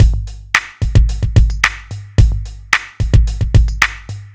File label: r n b kit 110